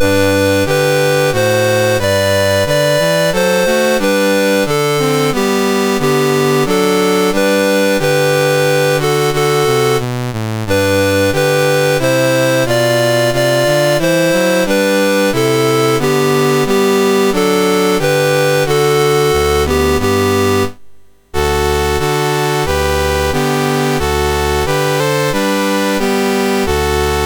MOS6581R3, National Anthems; Rhodesia; Dave Marquis

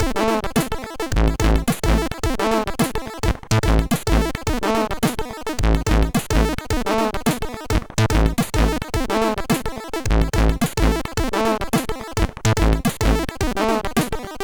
Unboxed Turn Disk 8580 sid MOS6581R3

